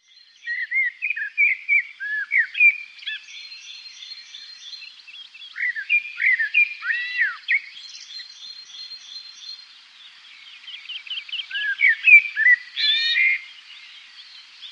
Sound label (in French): merle noir